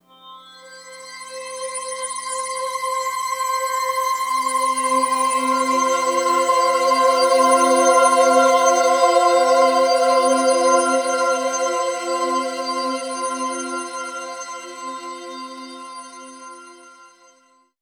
Hi Complex Voice; Sonic Foundry; Sony Creative Software; James Johnson Spektral Minimalism

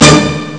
orch hit; ST-XX Amiga Soundtracker Sample Packs

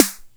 Snare Space, TR-606 Bent Samples; Sonic Foundry (Sony Creative Software); The Electronic Music Manuscript Collection; Battery 3 Sample Kits

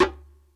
PERC, ETHNIC DRUMS; Crypton - DrumBase5000

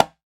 CONGA CLOSE; E-MU Formula 4000 Series Vol. 4; Earth Tones

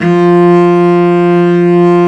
cello, Arco Solo; String Master Series

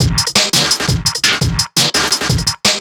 Break, Drum N Bass Percussion

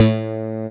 DIGITAL BASS, BassGuitar

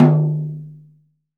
HTOM P; VELOCITY TOM 2

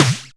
Flange Snares; Best Service ProSamples vol.37; Dance Synths; Percussion

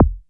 MINIM BD; DANCE KIT

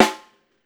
STR. SNAR; Drums&Percussion; E-MU Producer Series Vol. 7 – Old World Instruments

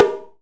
SHIME, JAPANESE PERCUSSION; AKAI S6000 CD-ROM - Volume 5; Ethic

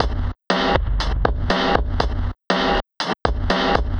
Noise Beat; Drums & Drones

